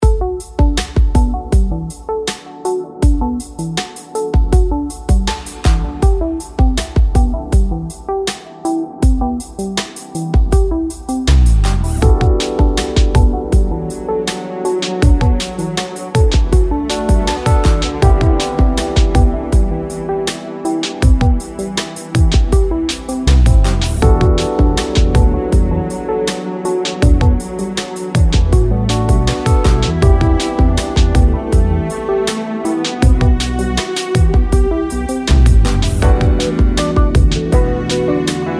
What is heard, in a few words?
Groove Lounge